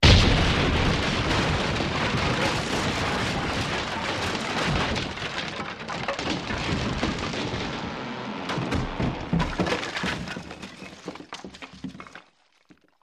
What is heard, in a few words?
Explosion Wood Brick, rock & sand; Explosion, Wood & Brick Buildings 1